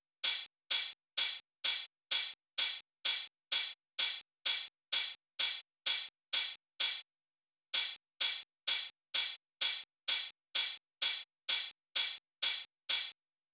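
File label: Drum Loop open hi-hat, 128BPM; VEC5 Drumloops; VEC5 Multi Loops 128BPM; Loop Elements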